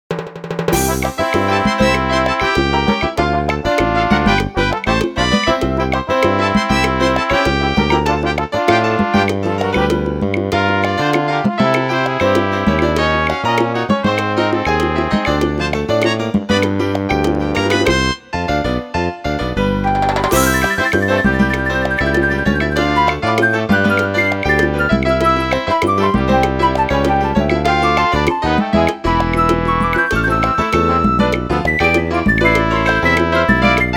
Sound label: Salsa; Incredible Machine 2